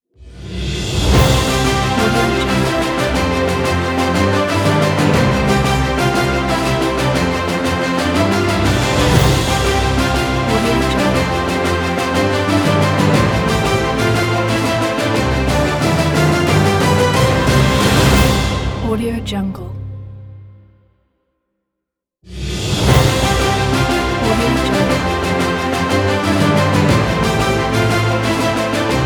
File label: victory awards ident